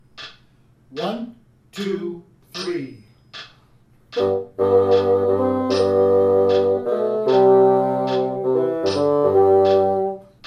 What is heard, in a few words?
Carry Bassoon 1 and 2 76; Rubank Elementary